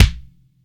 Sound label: MIX KICK, TIGHT KICK